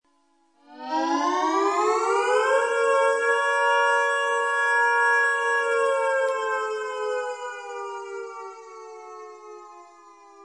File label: sirene